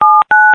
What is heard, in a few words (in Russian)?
тон